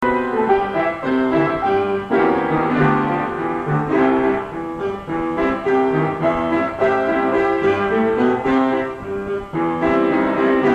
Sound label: Piano Track